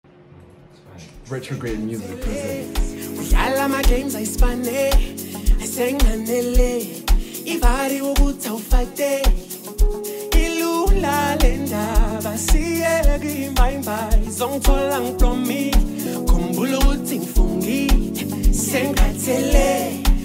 Amapiano Song

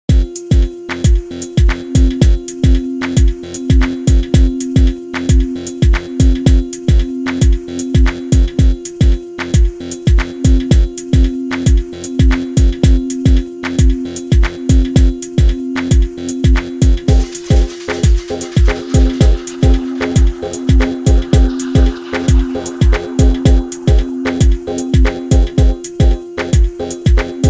Ambience

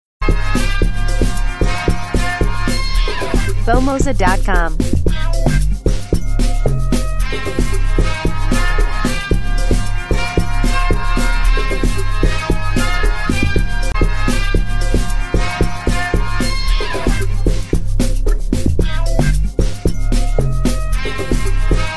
Amapiano

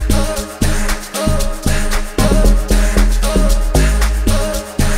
Amapiano Live Balcony Mix

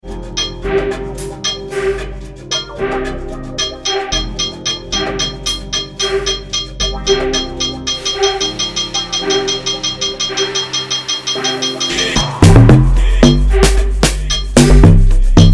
Amapiano Beat

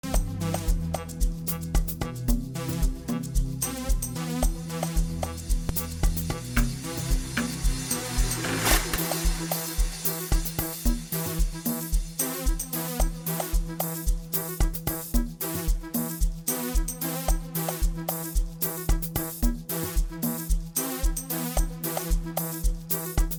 Sample pack Drum

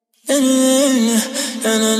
Acapella

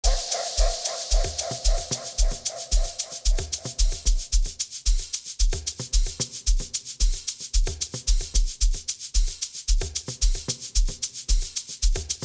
Amapiano Mix

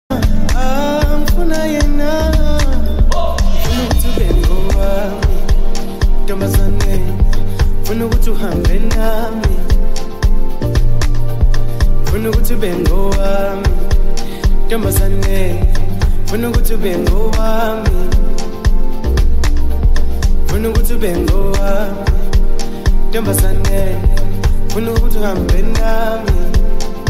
Amapiano

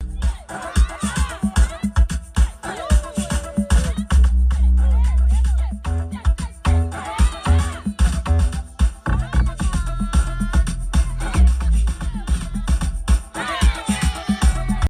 Amapiano

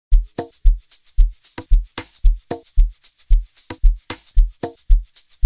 Piano

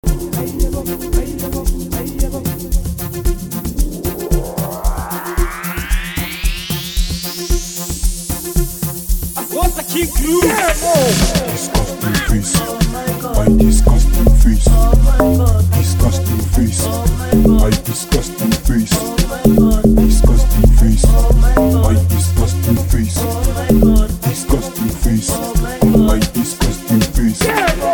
Amapiano

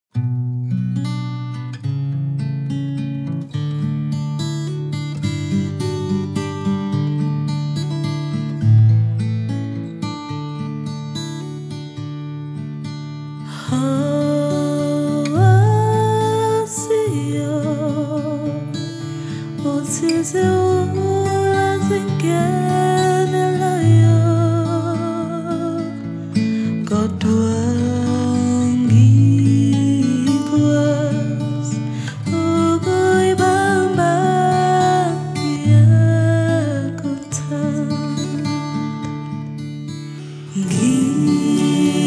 Youth Choir

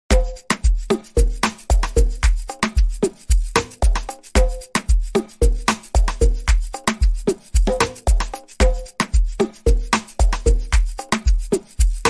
AMAPIANO REMIX

